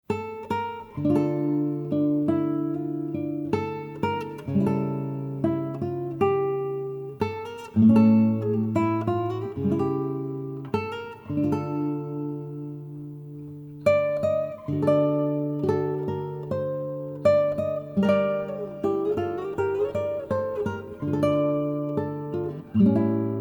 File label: Birthday Song